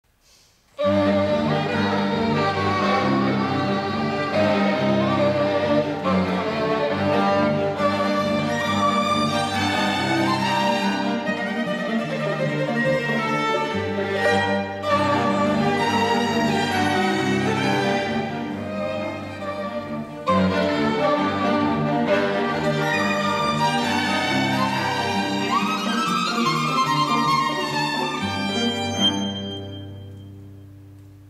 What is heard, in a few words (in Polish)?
KWARTET Taniec wegierski; J. Brahms